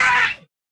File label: Bleeder Hurt Vox; Sid Meier's Civilization 4 Complete; Beyond the Sword; Units